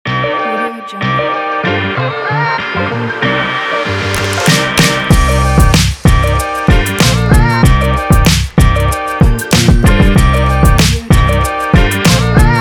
this is hiphop vintage

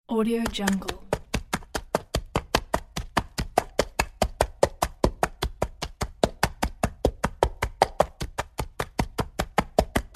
horse walk

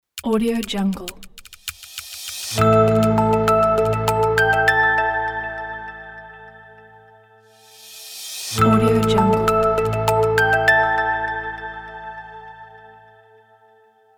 logo intro

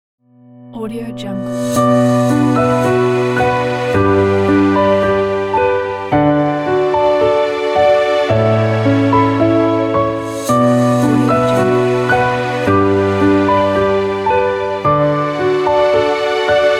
emotional inspiring piano

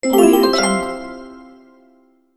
success win

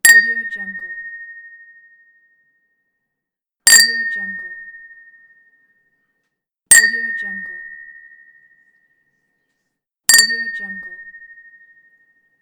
glass clink

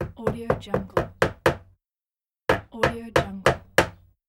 hammer

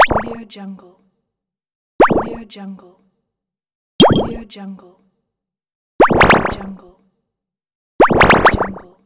vitamin bubble buttons

